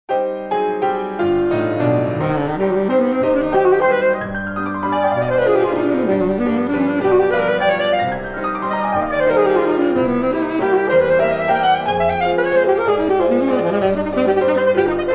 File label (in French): valse, saxophone et piano